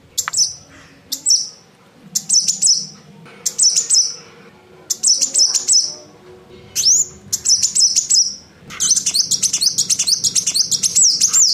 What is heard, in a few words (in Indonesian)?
Suara Burung Kolibri Kelapa untuk Masteran